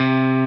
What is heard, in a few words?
Arp. x x x x 3